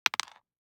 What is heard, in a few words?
DICE on Cardboard, Throw and Roll, Mini, 1 One Die; Samples; ArticulatedSounds; DICE Sfx Party (Extended)